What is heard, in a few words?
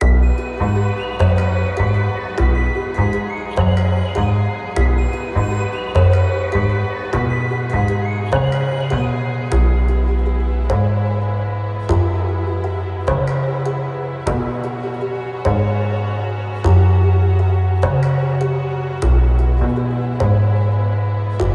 Jovial Flute; Aztech Forgotten Gods